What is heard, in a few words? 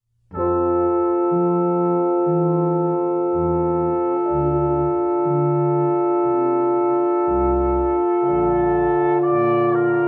Brass Quintet Air on the G String; Johann Sebastian Bach